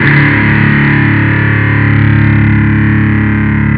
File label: guitar 4th; jazz jackrabbit 2